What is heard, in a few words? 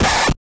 Drums; Distored Reality